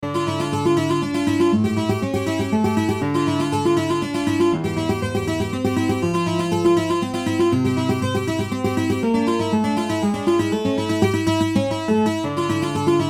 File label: Classical Guitar Ringtone